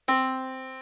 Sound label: yamaha Disk